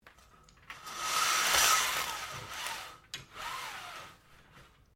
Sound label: Blinds Venetian Raise Slowly, Household blinds; Living Room Sound Effects; Living Room (Sound Effects:Household)